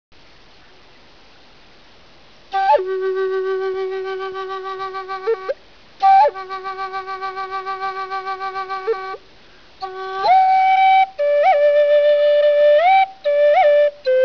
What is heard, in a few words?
lakota flute; native american